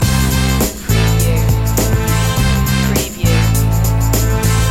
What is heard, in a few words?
soul beat loop middle